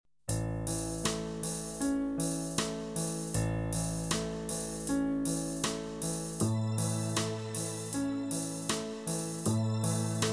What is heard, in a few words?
A, female